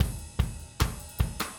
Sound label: 150 Ska Drums